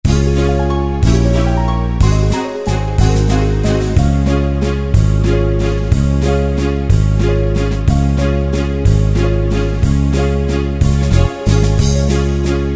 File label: Vienna Waltz, Yamaha QY70 Preset Styles